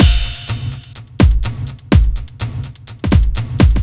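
Metal No snare, Drum Loops 125bpm, Nu Dance Electro; Zero-G; Soundclash Nu Dance Electro; Acidized Wavs; Tommy Metal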